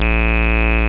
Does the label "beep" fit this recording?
Yes